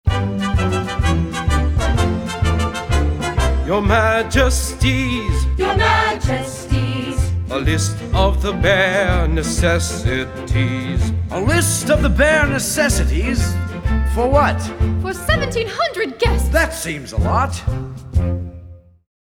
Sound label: Your Majesties VOCAL EXCERPT; audition materials cinderella